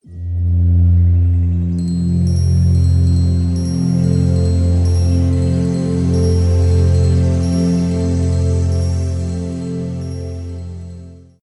Old Ubuntu Startup, Operating Systems Soundpack; Linux

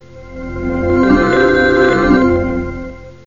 Interface Manager Startup; Windows Whistler Interface Manager Startup; Windows Never Released Soundpack 1

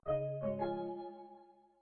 Windows Interface 7-8 Startup; Windows Never Released Soundpack 2

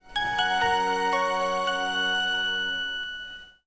Nokia Alternate Startup

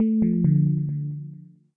Shutdown, Operating Systems; GNOME Shutdown

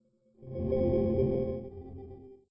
Windows NT 7.0 Embedded Shutdown; Windows Never Released Soundpack 2

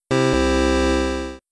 Windows 3.0 & Interface Managers Startup; Windows Never Released Soundpack 1

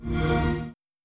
XBOX Two Startup; Video Game Consoles Startup

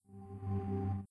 Windows Shutdown; Windows Never Released Soundpack 2

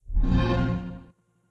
Xbox Two Startup; Video Game Consoles Startup

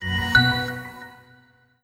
Samsung Galaxy S2 Shutdown

